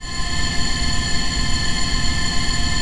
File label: pad, atmo pads; Best Service; XX Large